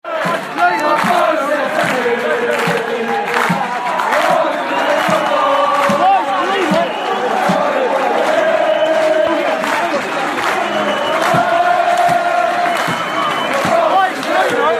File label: allez allez allez